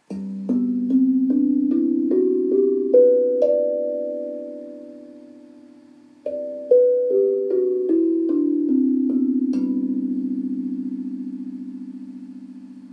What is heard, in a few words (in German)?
Zenko Equinox Schlägel